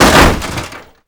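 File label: debris metal medium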